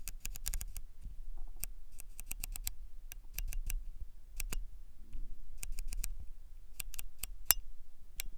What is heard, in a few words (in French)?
MOLETTE COMPENSATION EXPOSITION